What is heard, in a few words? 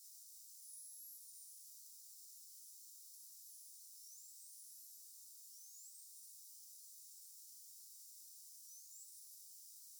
whistle